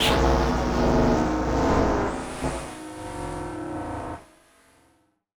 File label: Rando Syn, malfunctioning synth samples, Random Synth Failure